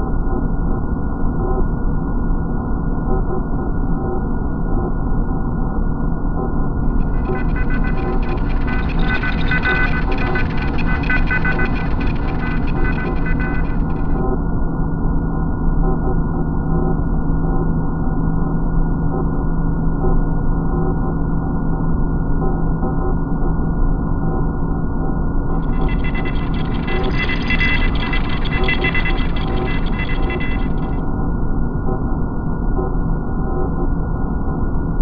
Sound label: rift room loop; ambient; morass beta